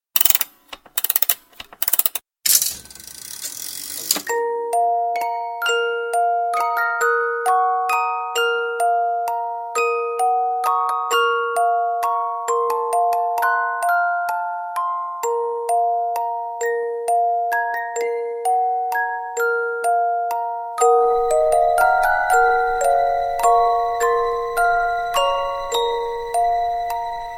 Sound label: Musicbox Loud; Fable2